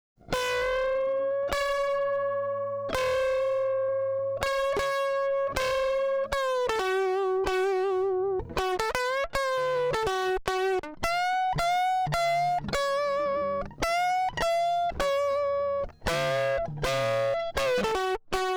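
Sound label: distorted Guitar Rhythm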